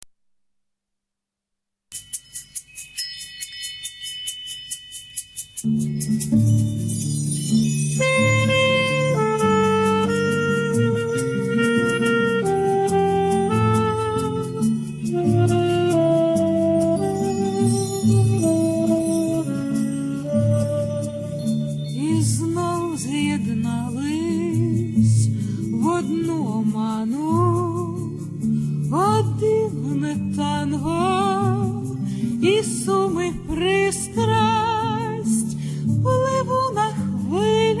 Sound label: slova Olena Teliha